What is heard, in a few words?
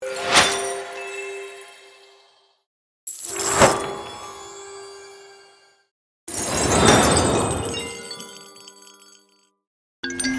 Magic Creation, organic ui building game sfx; APPlicable Sounds